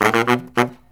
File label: BARYT, Killer Brass Riffs